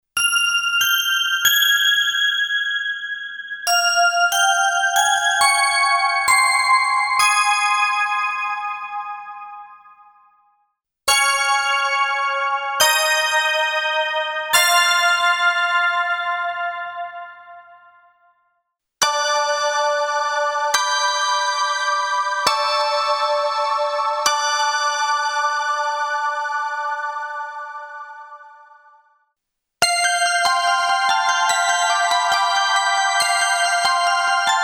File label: Live Worship Bells